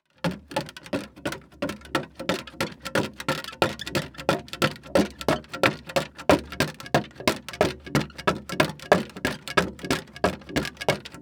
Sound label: Foley Footstep Golf Cleats Run On Hollow Wood; Foley Footsteps